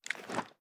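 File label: pistol reload extended mags large raise; gmod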